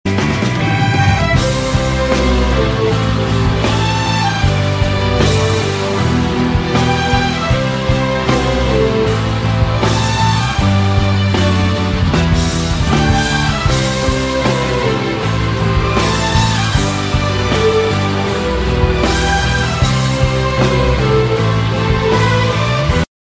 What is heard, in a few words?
theme ringtone; lootera